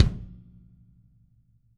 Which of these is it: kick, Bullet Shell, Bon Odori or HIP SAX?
kick